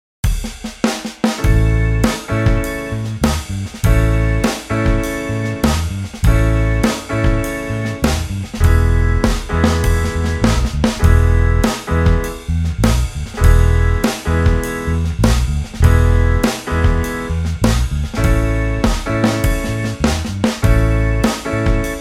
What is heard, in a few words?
blues; xmas